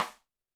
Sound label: Snare, Roland TD-20